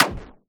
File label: Weapons Synth Blast